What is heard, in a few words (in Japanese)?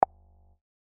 ポン コミカル